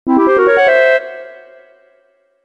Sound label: Jingle; Banjo Kazooie